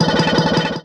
fx one shot ring chorus; shared system

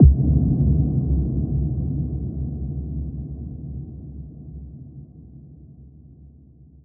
fx impact deep

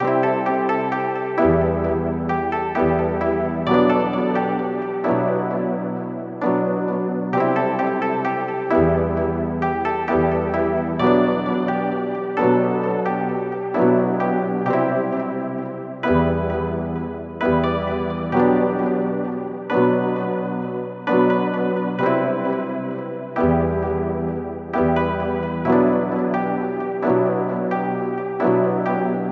Wavy Melodic Piano 131 E

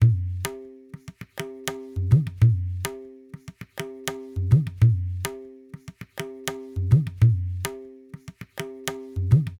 Bass Tabla, 100, C

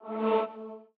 Synth, Am, Hit, Pad

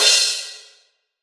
Crash

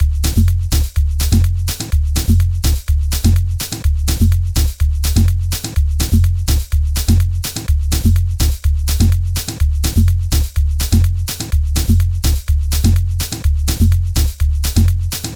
Grooves

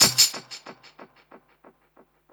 Shaker

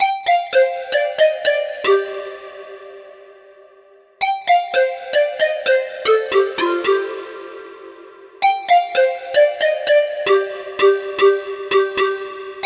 114 G Heat Flute Low

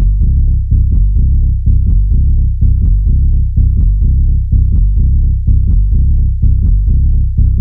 bass E 126

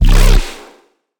Dubstep Bass One Shot C; Vital

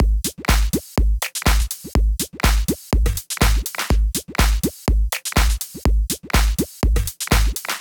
DRUMS

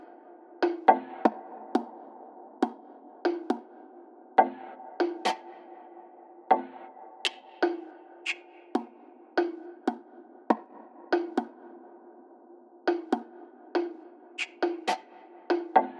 perc loop, 79

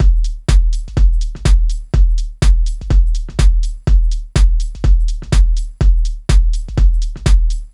Full Drum Loop

